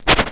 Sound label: fight